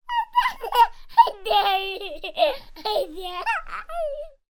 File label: human baby toddler male 15 months laugh; sound effects one